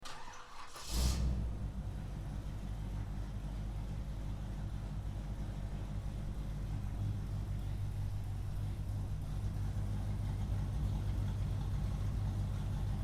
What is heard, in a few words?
muscle car start up alleyway